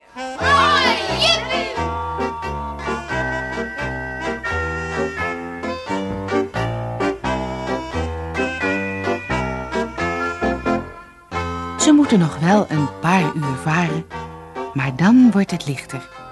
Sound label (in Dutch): instrumentaal; Dzjinn; Kinderen van de kinderboot